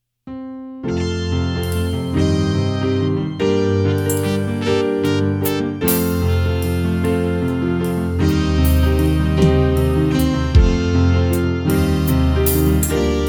bells instrumental; harmonize

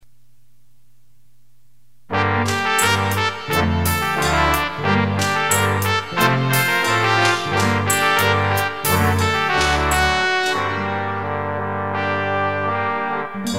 trumpet mini

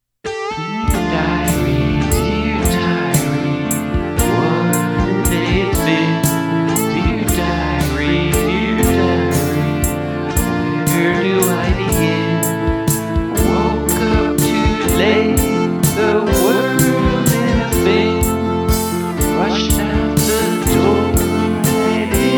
sing

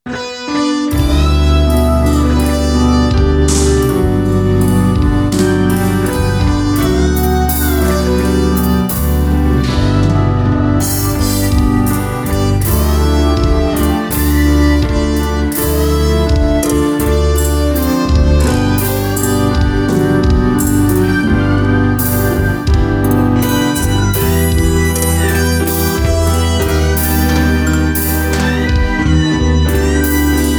inst

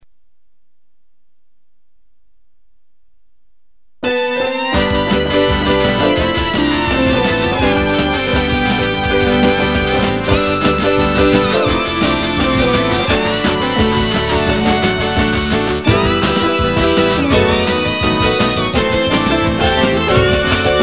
Guitar Sax Drums

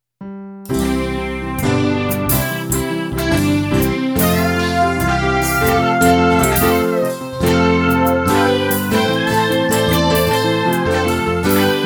inst

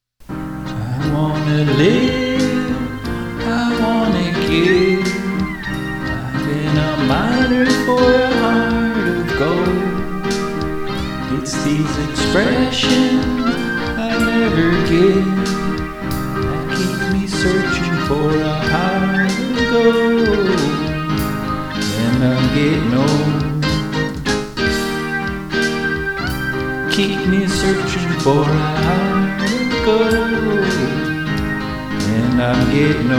sing

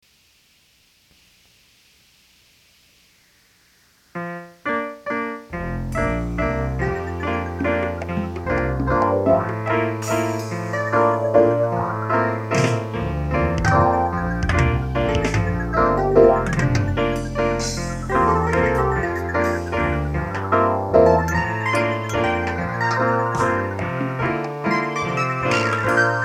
computer variations

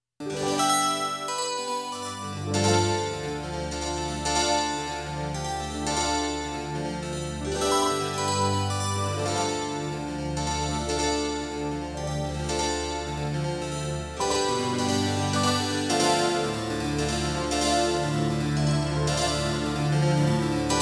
harpsichord feeling chorus

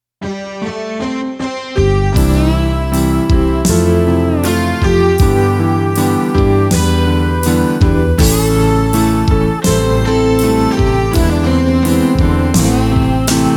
inst

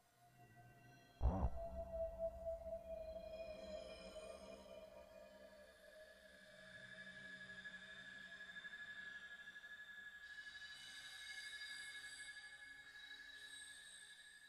horror chorus cavern of siren souls; juno g

